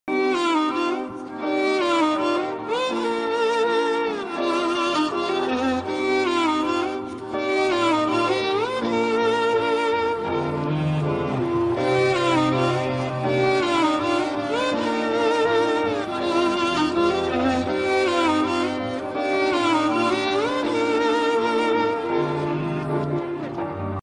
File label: song ringtone instrumental ringtone